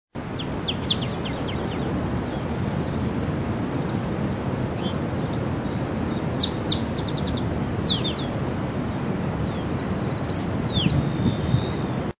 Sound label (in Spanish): voz varillero ala amarilla